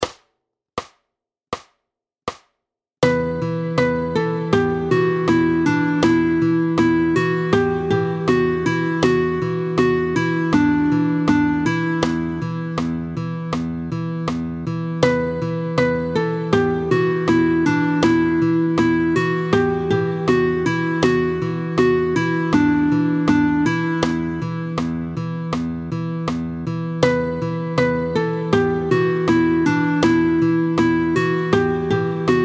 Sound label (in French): Aeolien, Le mode de mi aéolien, tempo 80